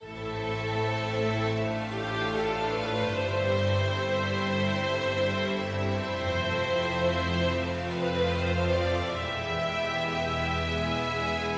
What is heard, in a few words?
sad forte